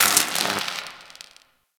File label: Gas Robot Repair Spark; Warframe; Corpus